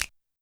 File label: Finger Snap; YAMAHA RM1X